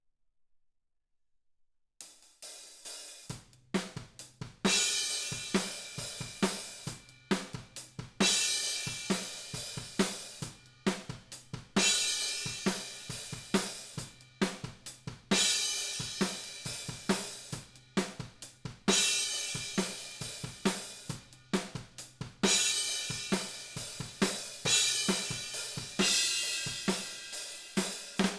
OHS

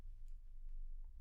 Bass Room

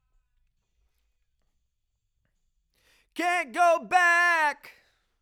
Chorus

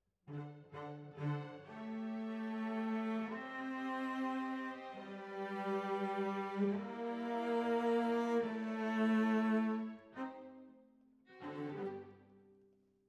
Cellos

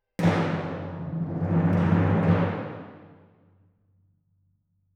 Timp

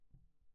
Bass

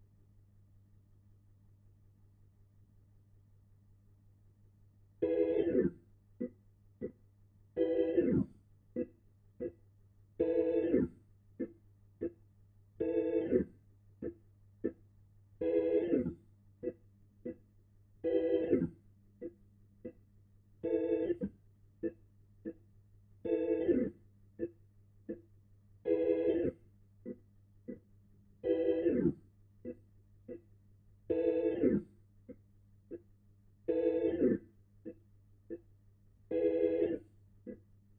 Hammond

